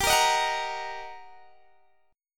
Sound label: G Minor Major 7th Sharp 5th, down, Guitar, DADGAD